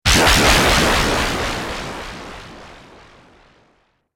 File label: Laser Zap, Laser Gun